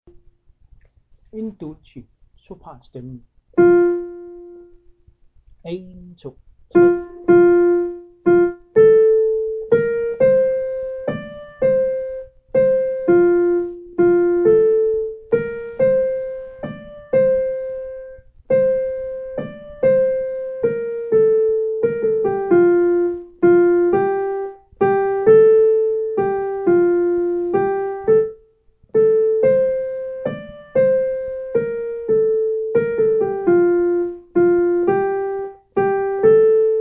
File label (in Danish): sopran